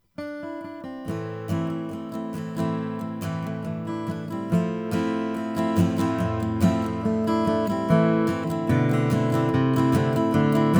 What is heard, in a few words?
om brazilian rosewood; hewett